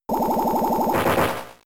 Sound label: Sludge Bomb; Pokemon; Gen 3; attack moves; RSE